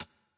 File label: Cable On Click; Puzzle